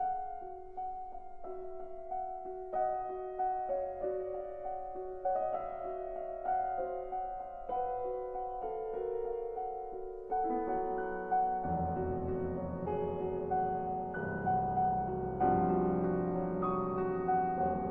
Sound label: ostinato